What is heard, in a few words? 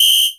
Whist, Percussion